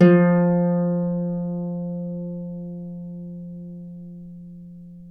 Celtic Harp, Plucked Strings

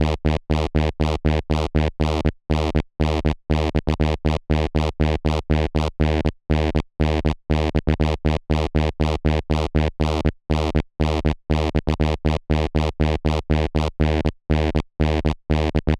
Techno Synth; Sonic Foundry (Sony Creative Software); Trance NRG VOL-2; Techno Synths

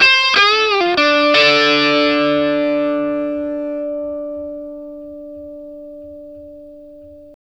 Vintage Blues Guitar, 60, D; USB Soundscan vol.22; AKAI

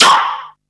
Snare, Drum & Bass

